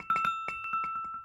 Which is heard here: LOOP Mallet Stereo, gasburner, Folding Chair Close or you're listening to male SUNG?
LOOP Mallet Stereo